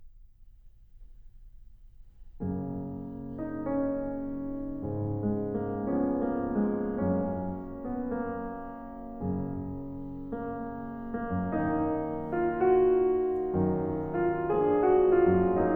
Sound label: Oriental Melody